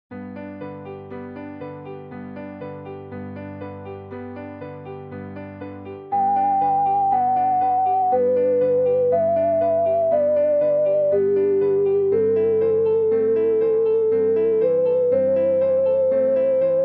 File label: example of synthetic vibrato: pure sine-tone with constant pitch vibrato